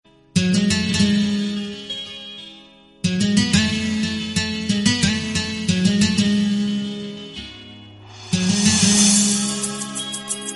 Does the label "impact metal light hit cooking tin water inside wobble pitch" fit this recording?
No